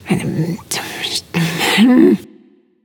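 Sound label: grumble, female; smite; voicepack; hera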